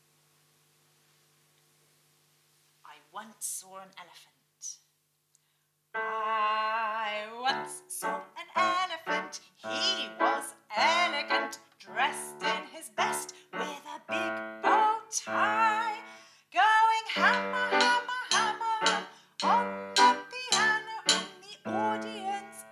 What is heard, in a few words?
Vocal and Backing